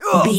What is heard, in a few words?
voice male grunt pain